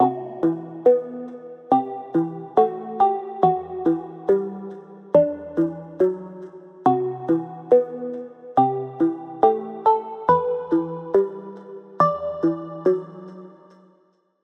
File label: Pluck; Routed Sound; Serum 2 Expansion - Street Vanquisher Vol.1